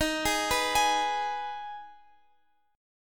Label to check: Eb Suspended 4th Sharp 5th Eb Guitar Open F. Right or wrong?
Right